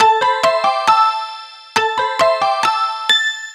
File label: Arp, Keyboards